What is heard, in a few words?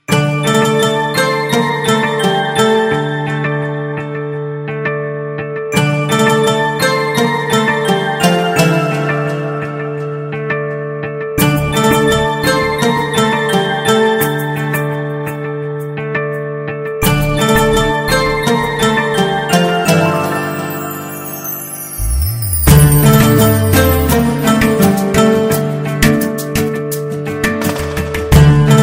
love in instrument, ringtones